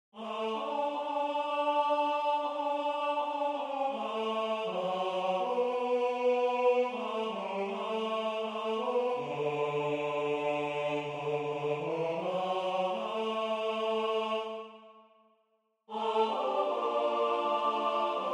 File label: Tutti; Where shall Wisdom be found